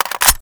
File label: mag in, weapons; aykayforty